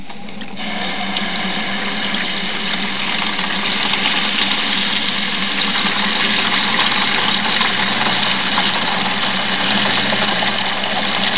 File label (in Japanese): mizu